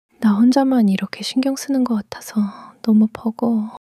sulk long sulk

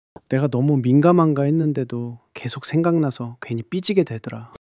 sulk long sulk

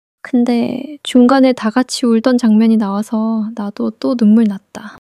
crying long crying

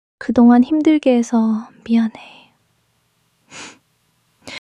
crying; monologue

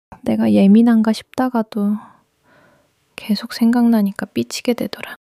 sulk long sulk

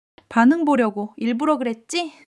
laughter; monologue

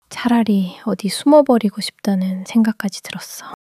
sulk long sulk

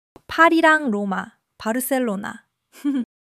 laughter

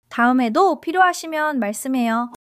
laughter; monologue

